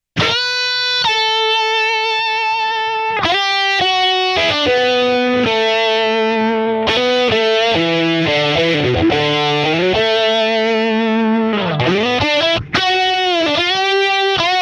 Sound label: Eventide Instant Phaser MkII Guitar Solo